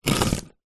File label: flower pot heavy clay slide drag hard ground; household